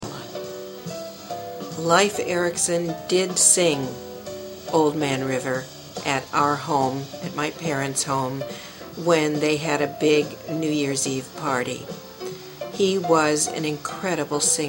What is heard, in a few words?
Singing